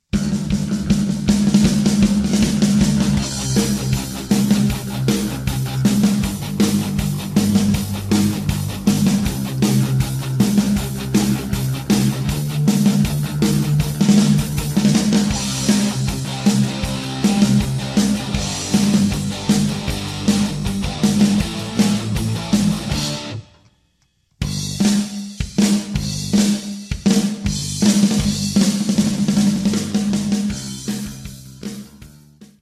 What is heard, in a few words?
backing track